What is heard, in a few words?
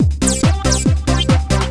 Happy Electronica